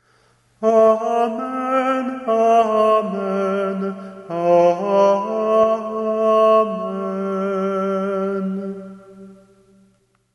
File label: Amen